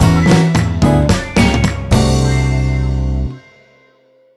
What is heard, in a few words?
Double Bogey Jingle; Fun! Fun! Minigolf; 3DS; Wii